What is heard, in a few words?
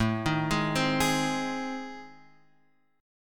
A Minor Major 7th Flat 5th, Guitar Open F; arpeggiated; AmM7b5; x,4,3,3,3,3; JGuitar